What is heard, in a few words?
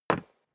step boots single wood parquet single stair down; schritte; Footsteps